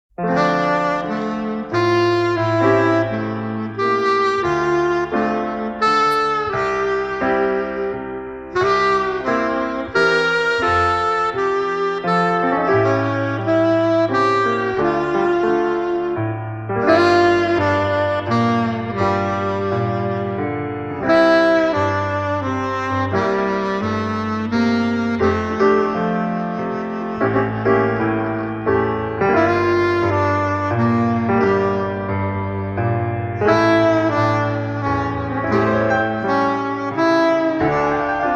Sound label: Hotel Piano & Saxophone